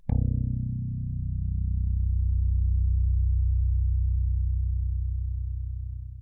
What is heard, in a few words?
Bass Picked B